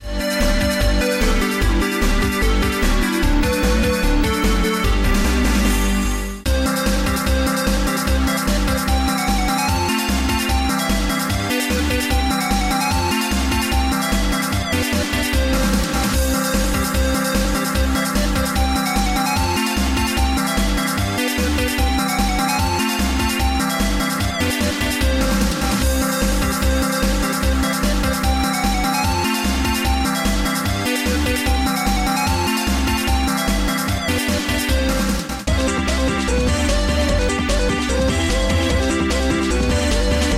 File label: magical tree, music msx